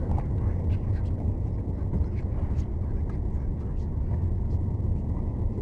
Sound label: Arabic; flames